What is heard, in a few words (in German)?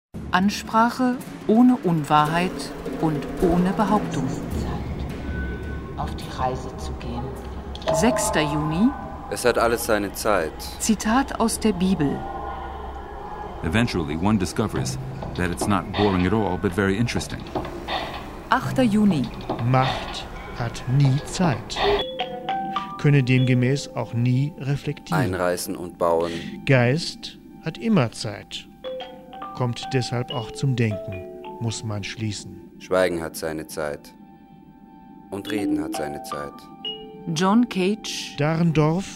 Jingle; rk Festival 2005